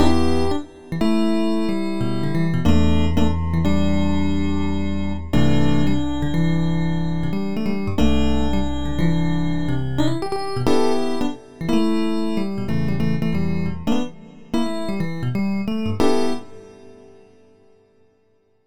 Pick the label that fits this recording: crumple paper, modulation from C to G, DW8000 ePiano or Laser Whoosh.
DW8000 ePiano